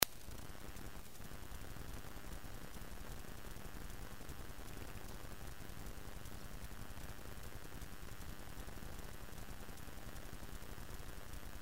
Mountain Streams soothing sounds of rippling water; Sounds of Nature- Mountain Streams; celtic harp & pan flute